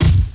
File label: HIT; THEREALM